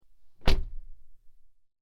door

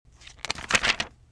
Turn Page

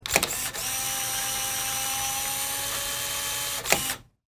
camera rewind

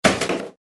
stumble then fall

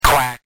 quack

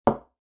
cup down

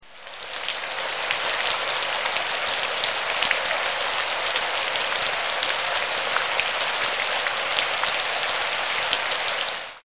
rain

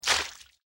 blood splash

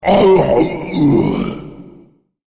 evil moan

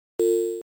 phone beeping

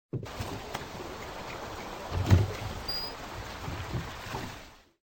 boat

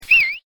whistle

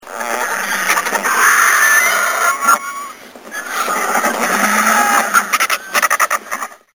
vultures

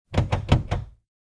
car door handle

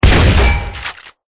gun shoot metal

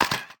CAMERA

